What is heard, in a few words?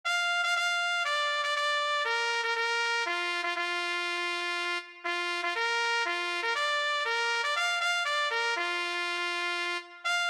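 Retreat, bugle band; london band drill competition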